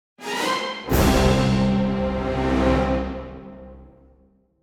Transition

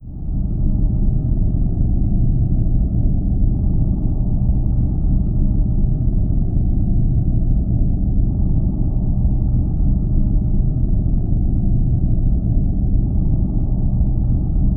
California Rumble

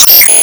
Bounce Ball Hit

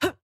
Attack Normal; Jackie

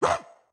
Triple Swipe Attack; Caffiend Caelan; Going Under

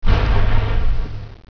Die

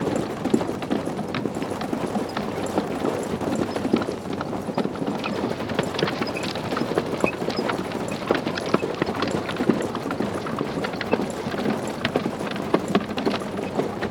horses and carts loop; w3d4 horses and carts loop

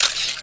Camera; D4DJ Groovy Mix; Android, iOS, Mobile

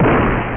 Tank

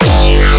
Bass Growl 200 G; Bass Growl Overacc2 200 G; exciplex sample presets pack; JinoBeats

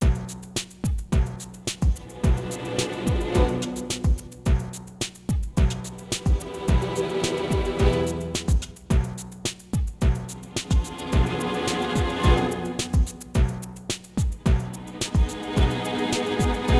Music; quest for the code windows gamerip 2002; Atd Music

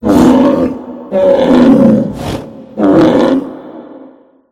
Woods - Bear Sounds

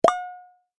Mine; Google Snake; Minesweeper

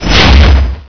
Chest Fall; Miner Max

